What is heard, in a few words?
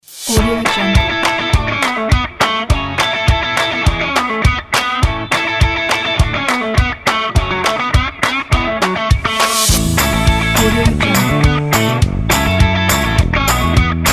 summer fun indie rock